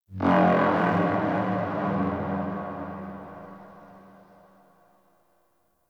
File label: Corpus Ship Energy Texture Large; Textures